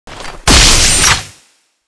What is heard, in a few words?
rocketpistol reload; zmall; zm boost; privilege; mboss